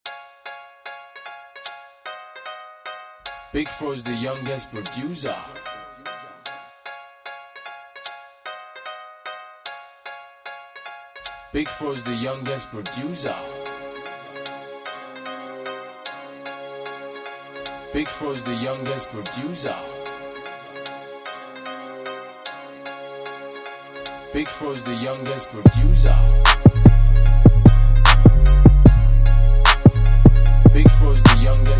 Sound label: INSTRUMENTAL